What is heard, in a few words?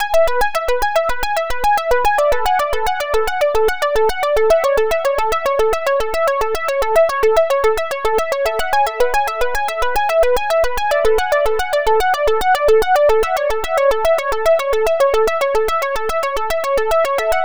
chorus sparkle